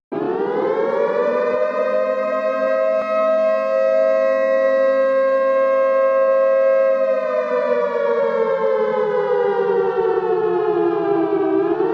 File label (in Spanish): alerta sirena